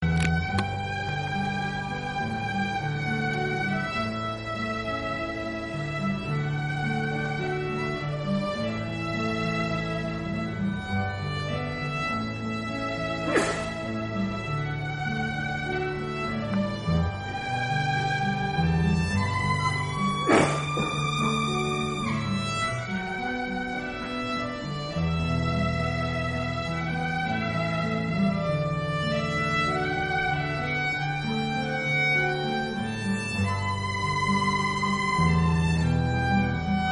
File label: violin; medjugorje 2014